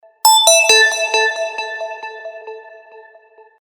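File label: krasivyj zvuk na sms, beautiful SMS sound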